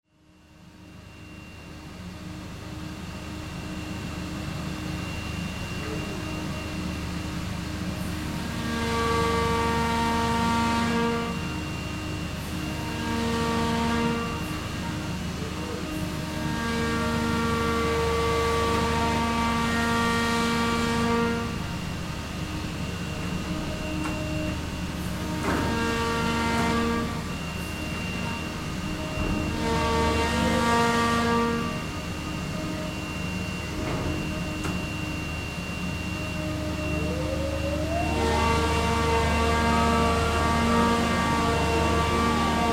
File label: machine room; Gottwald HMK; Reyðarfjörð; Um miðjan mars 2011 var ég sendur austur á Reyðarfjörð til að sinna viðgerð á Gottwald HMK